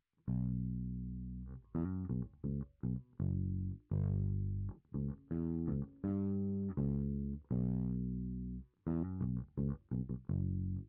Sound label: Bass Sansamp